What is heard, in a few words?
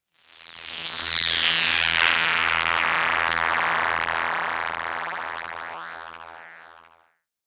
russian filter bpfilter fm